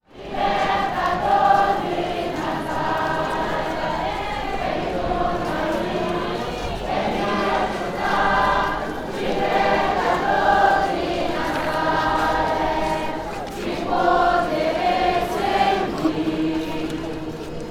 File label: procession chants; la republiqe des enfants